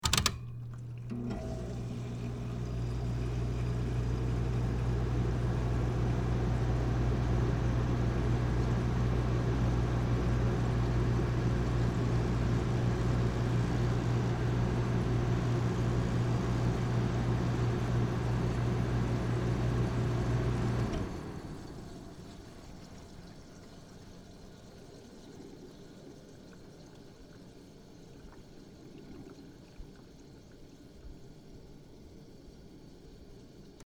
Air Conditioner; Air Conditioner Medium Setting; Household; Air Conditioners

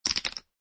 Poker Chips Stacking

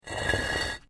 Brick Medium Hollow Move

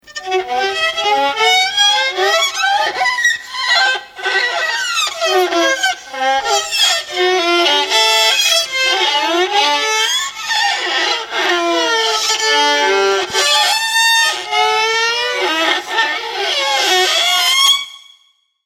Very badly played screechy violin.; Strings; Musical

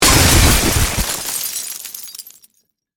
Crash Mix Rock; Crashes; Objects; BlastWave

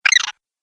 Cartoon Rodent; Cartoon Sound Design Sound Effects

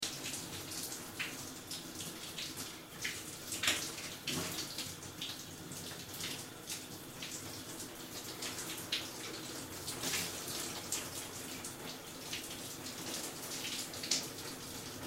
Shower Ambience, Shower Ambience Loop; Household; Bathroom

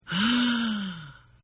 Gasp

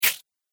Ghost Whoosh; Ghost Sound Effects; horror

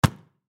Volleyball Catch; Miscellaneous Sports; Blastwave

